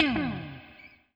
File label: NOIZ, Guitar; 90 s Sample CDs; Quantum Leap - Guitar & Bass